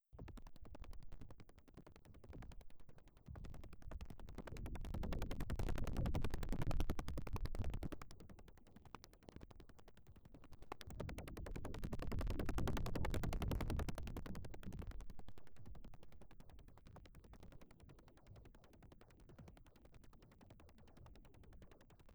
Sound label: Glitch